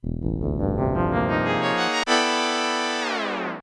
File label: Bowser's Goal Music; SMM